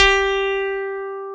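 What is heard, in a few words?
piano electric G4, CP-70; Sound & Vision; Gigapack I CD 2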